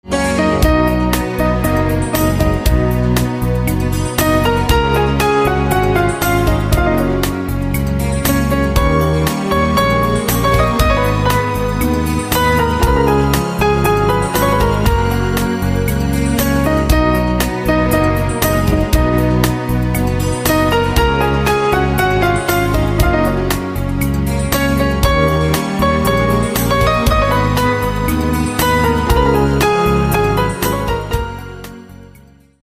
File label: Piano